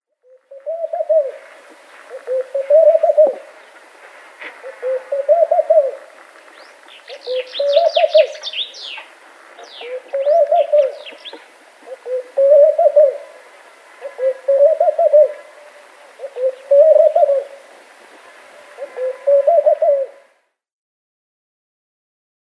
Laughing Dove male display

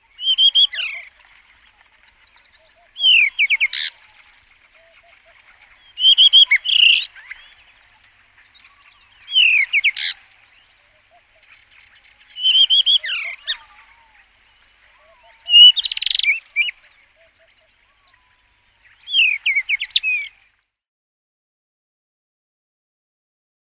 Black Bush Robin song

